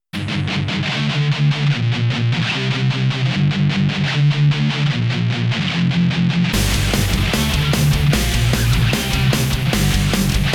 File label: Boss Battle Ultimate Rock Machine intro; Fallen Knight